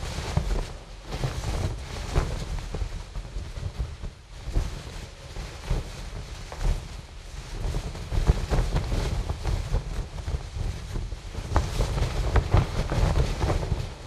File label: tarp mvmt canvas close; Titanfall 2